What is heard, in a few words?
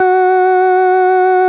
FARFISA, F#2, Vintage Keyboards